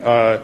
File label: uhh; gaben